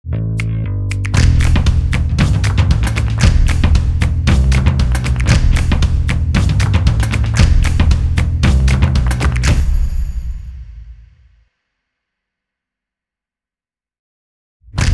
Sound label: fashion stomp